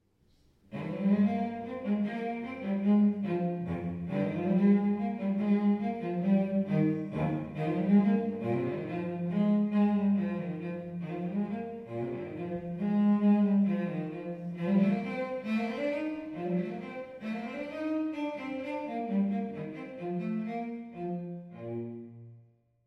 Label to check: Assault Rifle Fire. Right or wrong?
Wrong